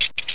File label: key lock; landslor